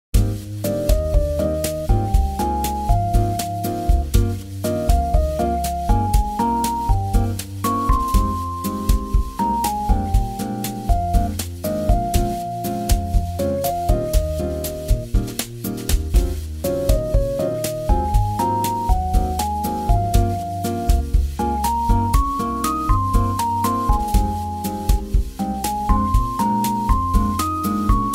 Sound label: Bossanova